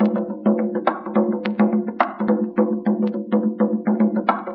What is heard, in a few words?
bongoid, Drum loops